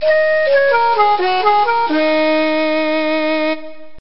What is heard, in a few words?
FLUTE; DAoC; Toa; Gamestar